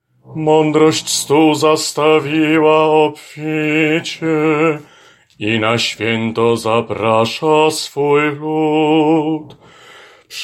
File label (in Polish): BAS; Madrosc stol